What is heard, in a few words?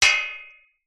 impacts metal gate post hit with small stone